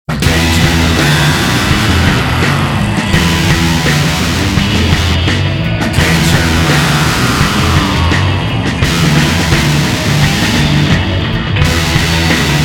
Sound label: Turnaround D